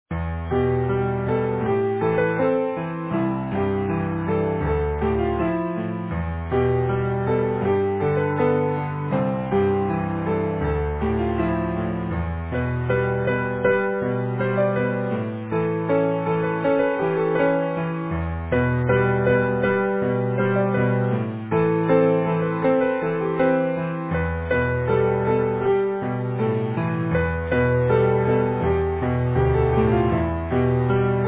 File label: Easy Piano